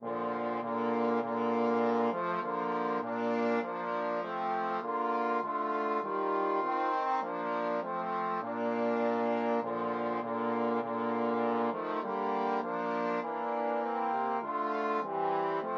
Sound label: hark trombone trio